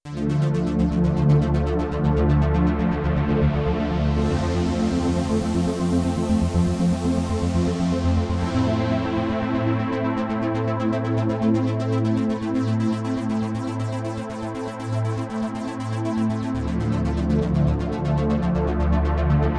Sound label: LPF Sweeper, Korg Radias-R